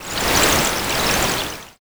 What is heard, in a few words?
bath soak